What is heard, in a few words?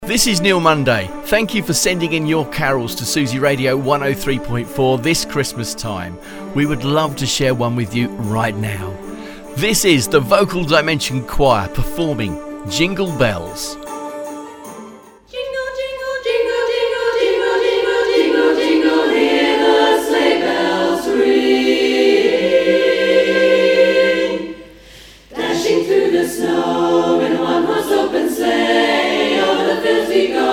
Choir Jingle Bells inc intro